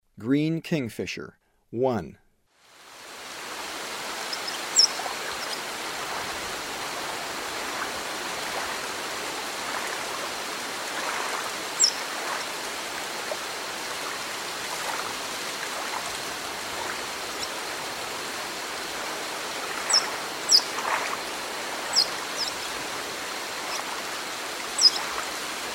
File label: Green Kingfisher; S. America; Eastern Lowlands